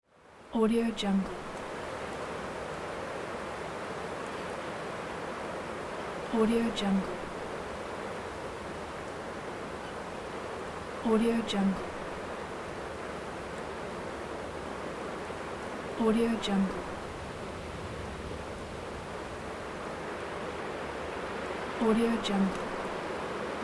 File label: soft wind